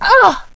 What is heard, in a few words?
HURT PAIN; VIP